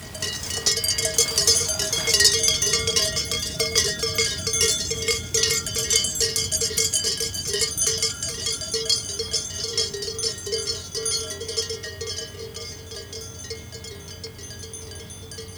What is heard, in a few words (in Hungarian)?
távolodó kolompoló nyáj; gorestanya; parasztudvar; juh